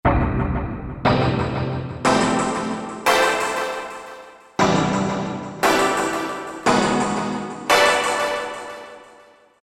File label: 3D IMPCT HIT, Roland SC-880; Factory Patches